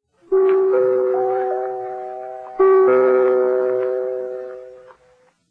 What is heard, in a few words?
chime; 7 chime; Coca cola; 1930